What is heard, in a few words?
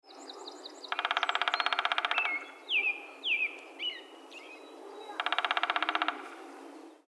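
Downy Woodpecker Drum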